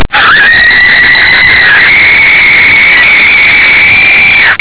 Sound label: scream